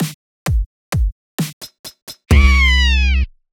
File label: snare kick kick snare hihats 808